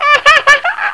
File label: Get Free Ship; Crystal Crazy